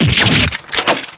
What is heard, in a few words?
damage